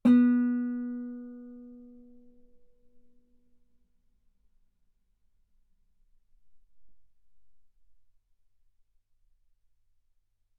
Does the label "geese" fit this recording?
No